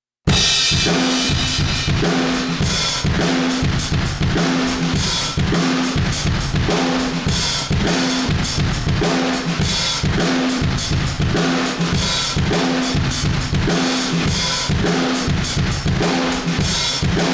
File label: Mid Room 1176 All Buttons